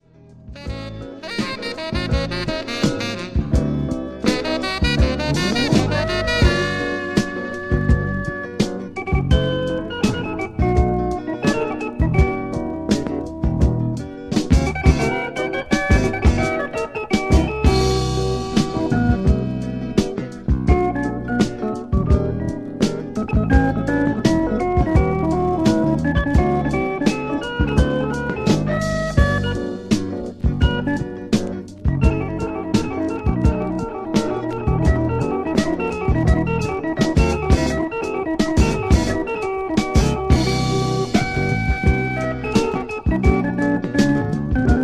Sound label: orgues Hammond